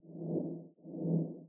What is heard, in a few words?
PASSIVE AMB STACK